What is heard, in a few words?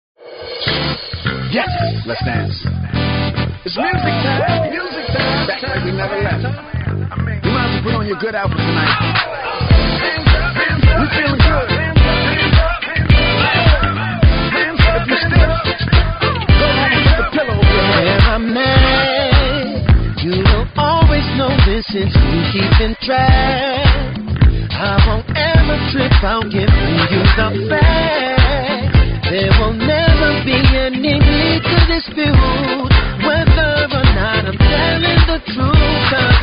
funk hiphop